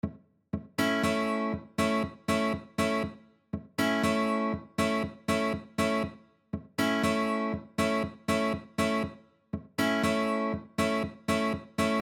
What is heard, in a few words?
Rhythm, guitar